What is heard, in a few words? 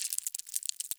Leviathan Idle; A Robot Named Fight